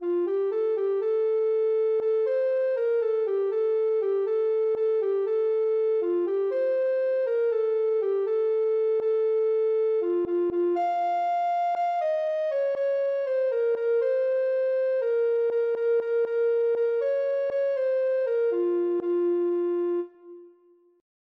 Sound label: ocarina